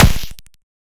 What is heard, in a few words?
SNARE - bitty snare; MAG-FOUR